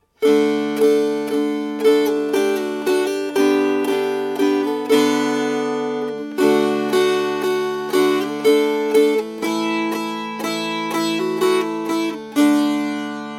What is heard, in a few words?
Strummed; Cryderville Jail